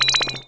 Restore Down; Shogo

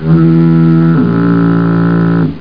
foghorn

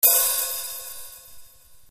bicie hihat open

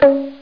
emu land; austrlia

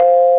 HUH; MPG-Net (USA); garden

